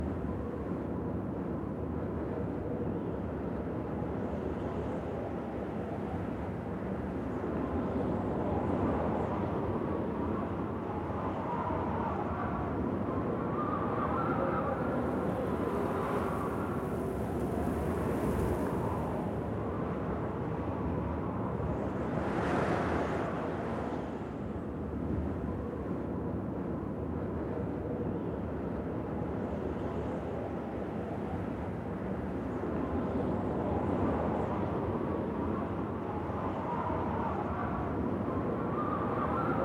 Environment Normal Wind; God Eater Resurrection; PlayStation Vita

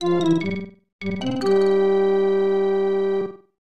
Title Fanfare

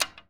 Ui Hero Selector Button

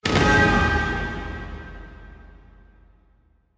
Mus Multi Jingle Ctf Getflag; Chronicles of Riddick Assault on Dark Athena

